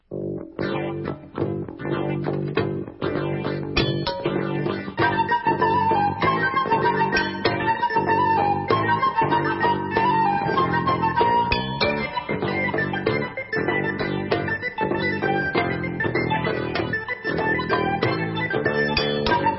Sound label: Debka Instrumental